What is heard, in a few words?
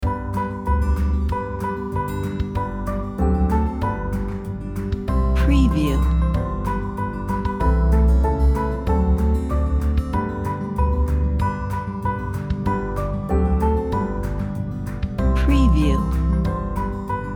Jingle Bells Guitar